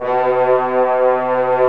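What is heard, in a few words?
French horn; Roland - LA Composer vol. 1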